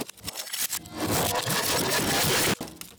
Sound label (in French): chute